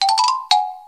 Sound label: puzzle solve